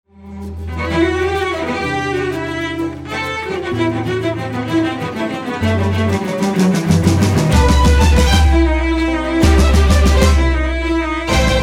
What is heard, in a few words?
Jig